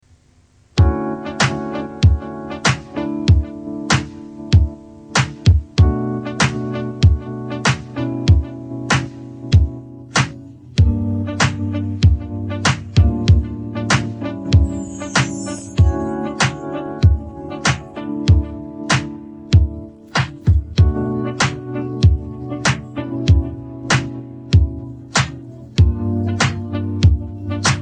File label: rain i do no vocals